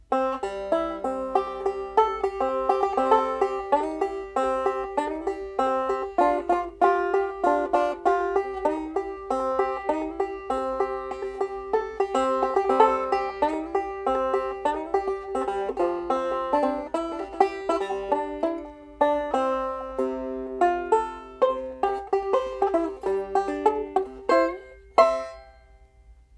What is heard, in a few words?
steel